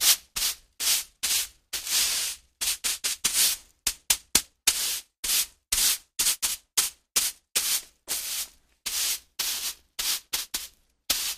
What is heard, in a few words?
Sweep Floor Normal; brooms & mops